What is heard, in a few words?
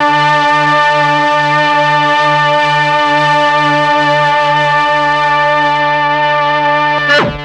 MEL STR, Legendary M400; ILIO; Propeller Island